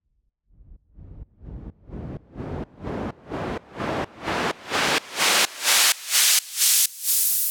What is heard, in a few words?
Raise SideChained